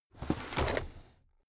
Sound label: drawer cabinet stuff open heavy